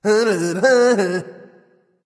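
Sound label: non-verbal tongue; smite; voicepack; houyi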